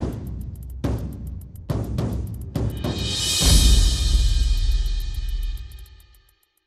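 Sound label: sting, low percussion cymbals; transformers war for cybertron gamerip; IACON IN RUINS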